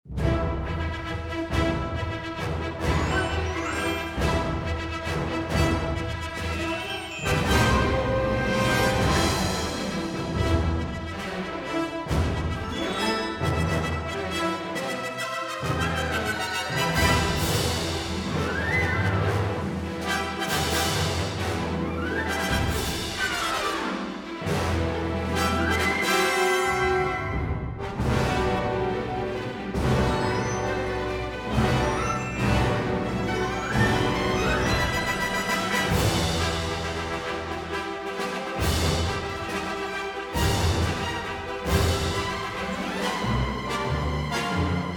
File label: Medium Intensity